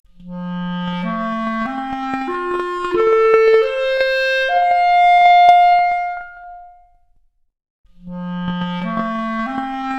Clarinet arp, Stereo output noised; 4bit

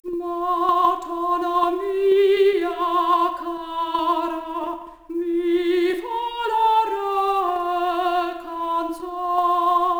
Soprano Stereo output noised, 3bit

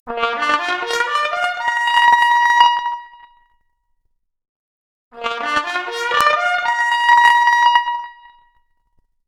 Trumpet arp, Stereo output noised; 4bit

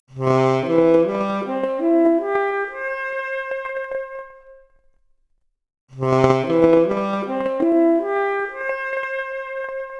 Saxophone arp, mp Stereo output noised, 4bit

